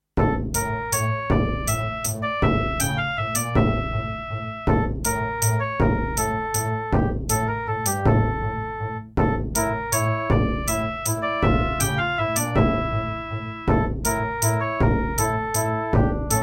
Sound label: Doepfer GMX-1